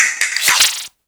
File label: Boss Attack; SFX MAD DD Boss Attack1